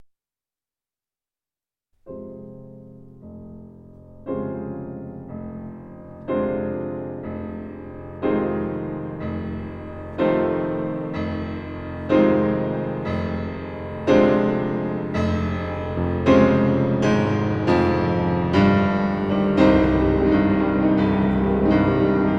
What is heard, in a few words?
Piano Concerto 2